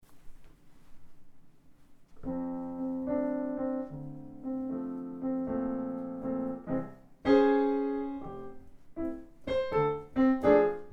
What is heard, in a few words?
Cookies Stomp, piano